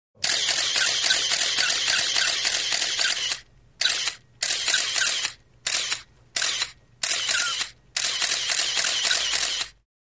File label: 35mm Still Camera